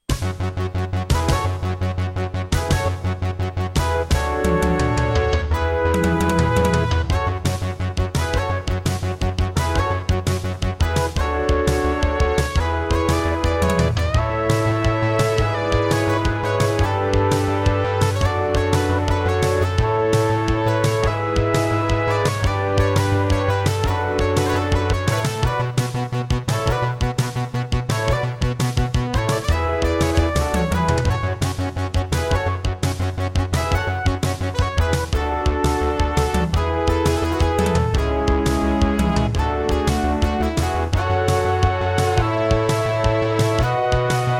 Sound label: Intro, Roland SC-55 MT32 Mode; 4D Sports Boxing